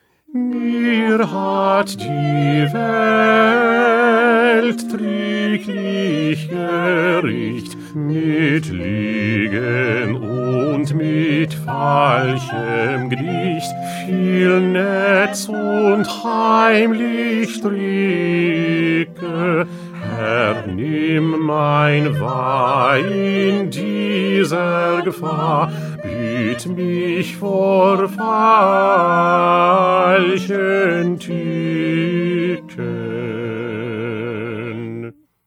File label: Bass; Bach; St Matthew Passion